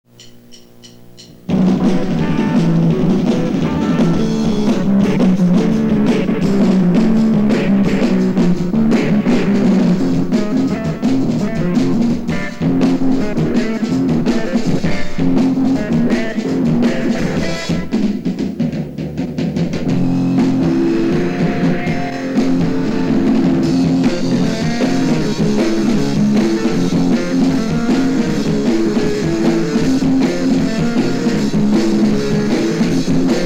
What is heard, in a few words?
tape